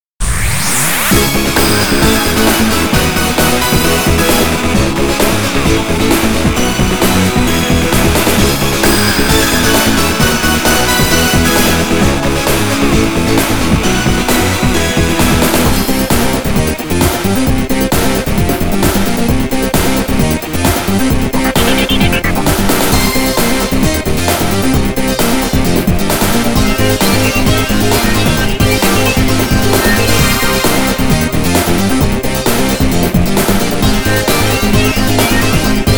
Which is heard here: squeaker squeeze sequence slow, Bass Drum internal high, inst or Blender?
inst